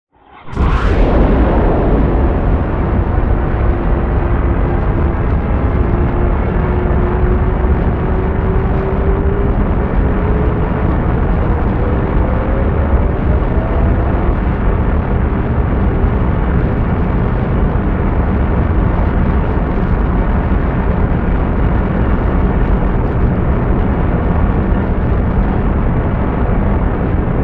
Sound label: large ship takeoff; Titanfall 2